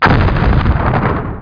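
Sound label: sound explosion; MOTS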